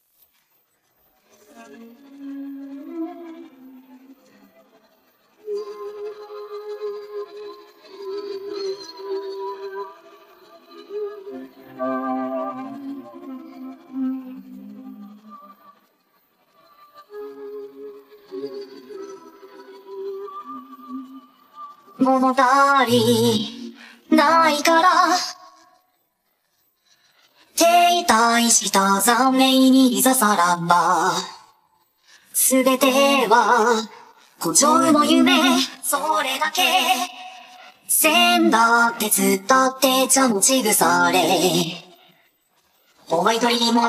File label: Vocals; 重音テトSV (Chilly Kasane Teto SV)